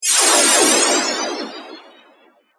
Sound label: Magic Shimmer Spell; fiction sound effects